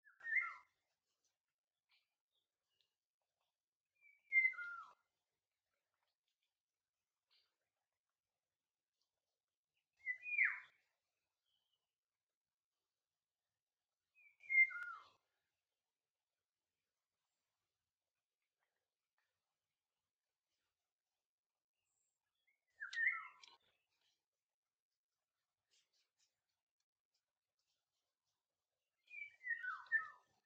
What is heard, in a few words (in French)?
chant du Loriot, Le loriot d'Europe Oriolus oriolus